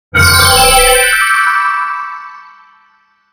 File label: Airy Descend Whoosh Shimmer; Production Element Imaging Element Accent Transition; 5.1 STEREO MIX; Blastwave